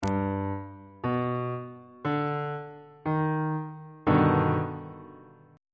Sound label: e flat augmented major 7th 1st inversion